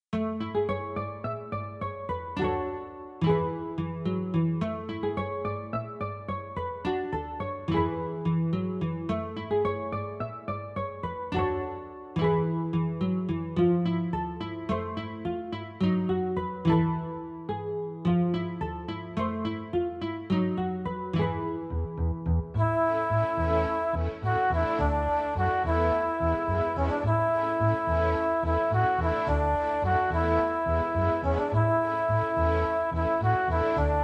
B3, XV-5080, GM Mode

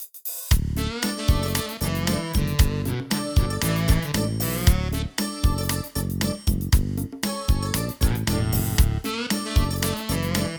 SC-55mkII On-Board; Roland SC-55 was the first synthesizer to use the General MIDI (GM) Standard; Monopoly; It's the original GM Synth!

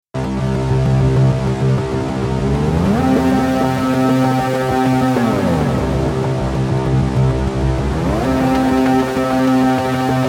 Weird Patch Channel 1 Wet; The other patch uses MFX #45 Multi Tap Delay

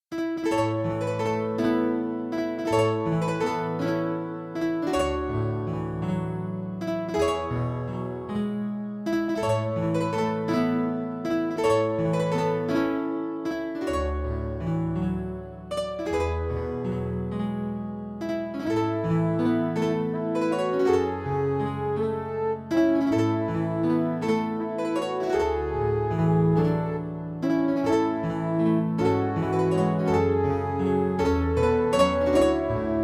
MT-32; DeathGate

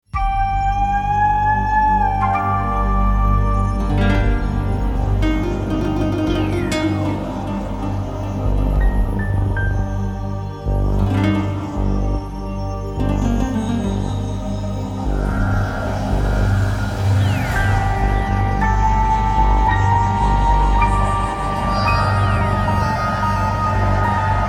Roland Fantom XR; Roland Fantom XR Synthesizer/Sampler

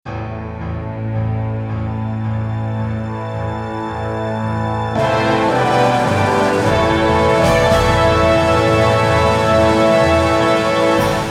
Fanfare XV-5080 Fantom XR; Contra

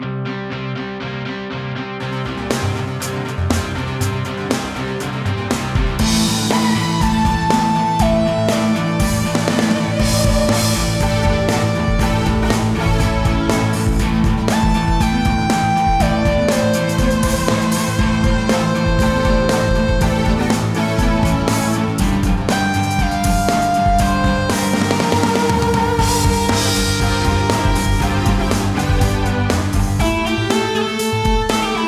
Drums, Synth Lead; High Score Theme

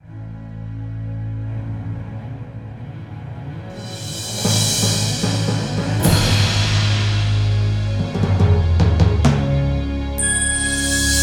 Fanfare SRX; KQ5; Sierra Fanfare

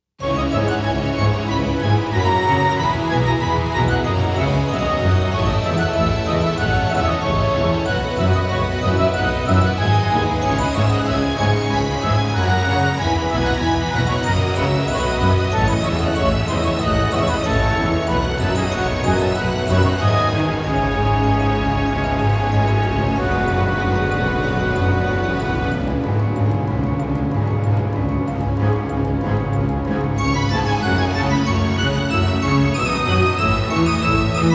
SRX; Fantom XR

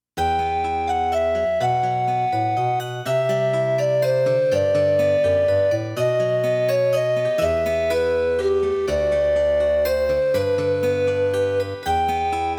SC-55 MT-32 Soundset; Deathgate

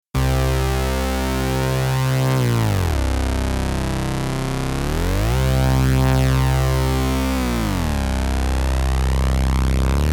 Weird Patch Channel 2 Dry

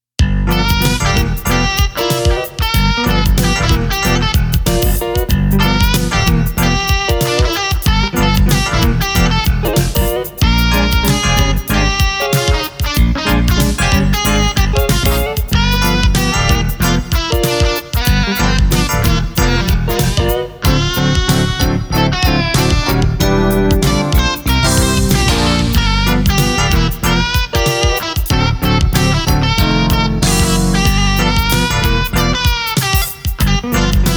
Roland SC-55mkII

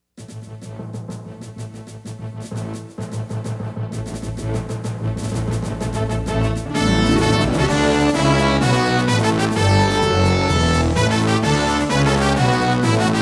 Remix Roland SC-8850

